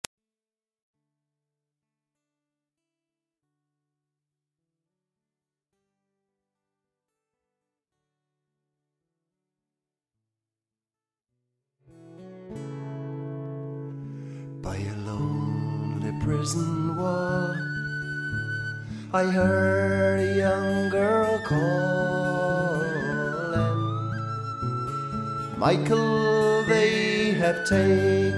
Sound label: Irish 2 Folk